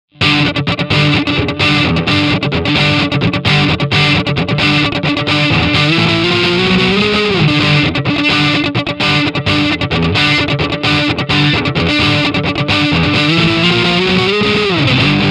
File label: funk; ampvergleich